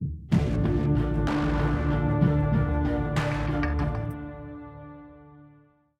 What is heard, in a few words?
190bpm